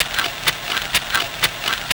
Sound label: Fx Vox; Equipped Music; Loungin House